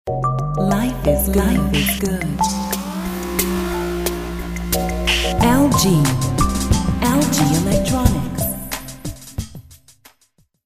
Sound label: lg sample tune